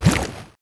tarmonster chase; Crypt of the NecroDancer

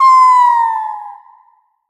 blues queen death; Crypt of the NecroDancer

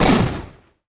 blunderbuss shoot; Crypt of the NecroDancer

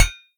dig fail; Crypt of the NecroDancer

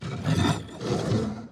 worm breathe, creatures; Factorio

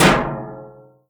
deathmetal shield drop; Crypt of the NecroDancer

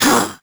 melee; Crypt of the NecroDancer

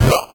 mole popout; Crypt of the NecroDancer

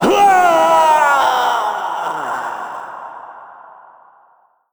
death; Crypt of the NecroDancer

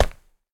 metal, walking; Factorio

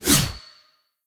courage; Crypt of the NecroDancer

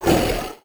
tarmonster grab; Crypt of the NecroDancer

